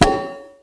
iron; Mafie 1

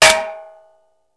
metal; Mafie 1

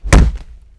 body; Mafie 1

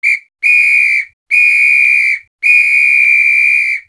fife; Mafie 1

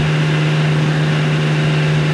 loop motor; Mafie 1

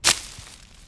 bush; Mafie 1

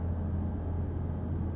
room; Mafie 1

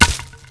bush; Mafie 1

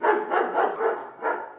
dog; Mafie 1

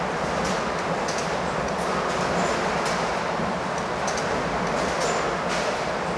industry; Mafie 1

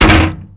metal; Mafie 1

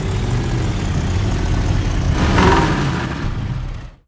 motor; Mafie 1

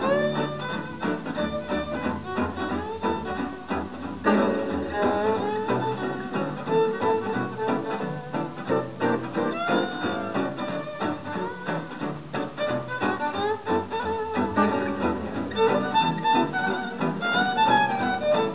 music; Mafie 1

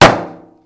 metal; Mafie 1

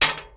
metal; Mafie 1

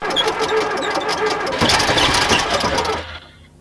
start bad; Mafie 1

